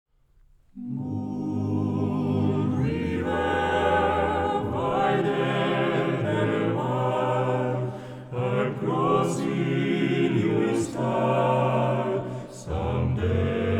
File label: Vocal Group